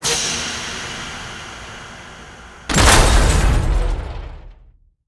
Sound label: skill boom